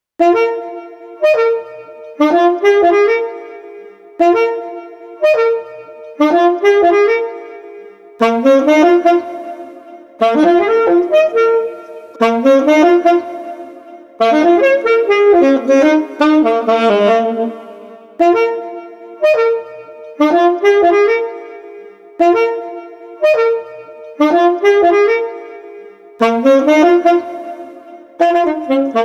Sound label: Street Alto Sax Playful 120